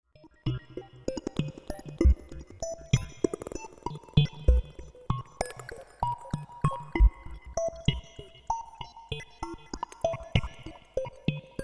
Bouncing Blocks, RS 370 Polyphonic harmonic generator